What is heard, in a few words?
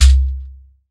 SHEKERE TONE, Latin Hand Perc; E-MU Producer Series Vol. 2; More Studio Essentials; Composer